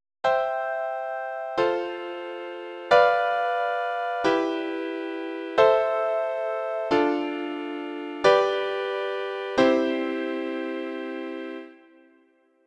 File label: Progression majeure en cercle; Tonic (music)